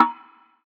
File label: Fx Vox; Equipped Music; Loungin House